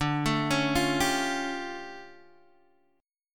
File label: D Minor Major 11th, D, down, Guitar DADGAD